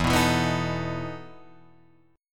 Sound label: E Major 7th Suspended 4th, Guitar, Drop D